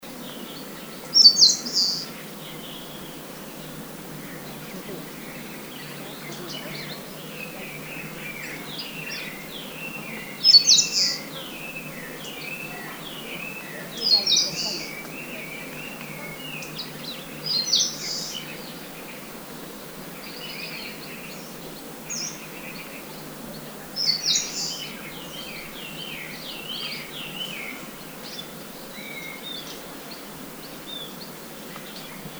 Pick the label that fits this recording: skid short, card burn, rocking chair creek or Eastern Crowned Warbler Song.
Eastern Crowned Warbler Song